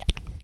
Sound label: wiggle; World Of Goo